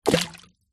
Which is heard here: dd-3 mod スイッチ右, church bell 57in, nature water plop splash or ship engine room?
nature water plop splash